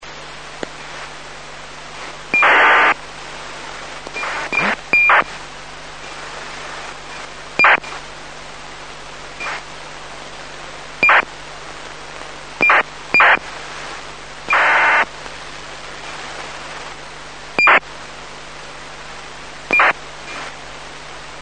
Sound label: VHF Acars